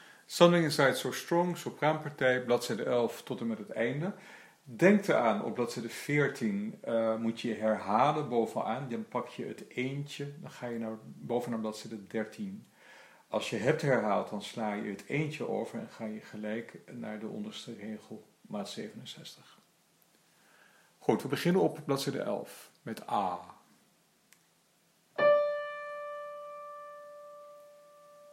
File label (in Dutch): Sopraan